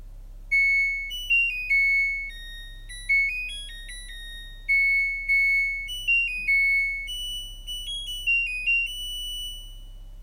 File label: Washing Machine; sensors